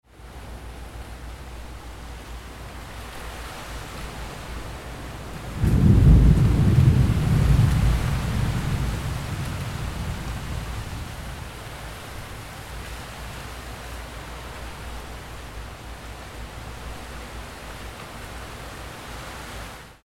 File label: Thunder